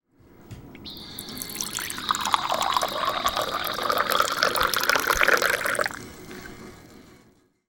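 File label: pouring tea; おちゃをそそぐ音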